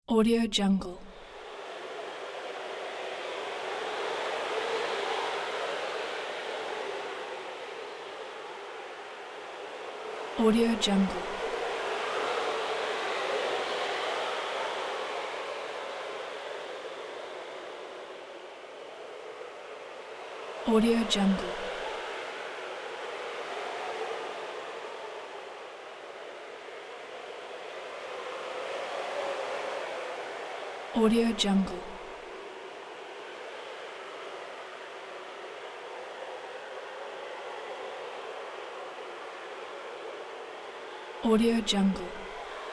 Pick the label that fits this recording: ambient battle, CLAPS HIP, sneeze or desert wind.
desert wind